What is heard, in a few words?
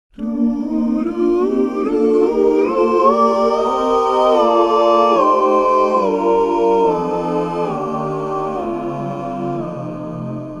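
mi shebeirach, cantor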